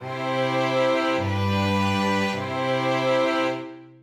cadenec authentic

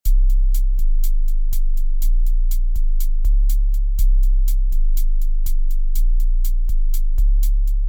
sub bass

